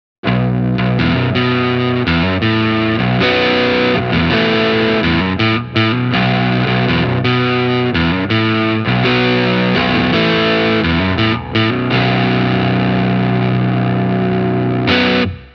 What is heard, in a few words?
prs se custom 24 overdrive, Guitar; Paul Reed Smith